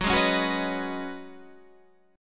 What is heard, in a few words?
Db 7th Suspended 2nd Suspended 4th Gb